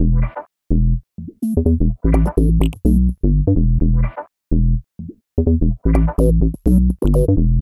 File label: 126 E COMBI GROOVE; Loopmasters; Funky Underground Vol 2; COMBI GROOVES